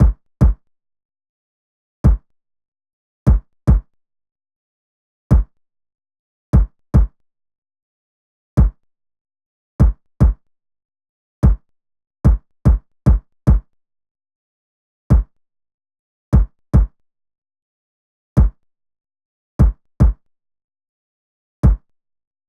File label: Kicks 147bpm; Loop, WAV